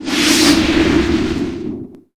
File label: magic faerie fire; wesnoth